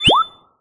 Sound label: collect item